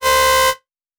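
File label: Small Alarm Single Loop; David Dumais Audio; Alarms Sound Fx Pack 1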